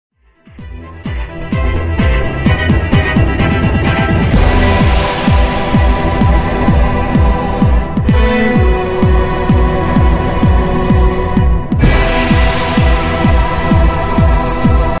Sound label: huawei y7 ringtone